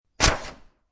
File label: Single Scssr Cut, Single scissor cut.; Scissors; Offices